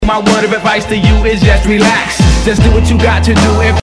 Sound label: hip hop